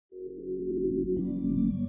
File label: sms